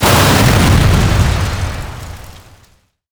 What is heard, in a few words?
boom